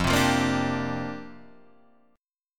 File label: C 7th Suspended 2nd Suspended 4th F